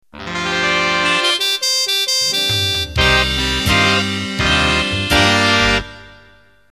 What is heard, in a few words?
Big Band Slow Intro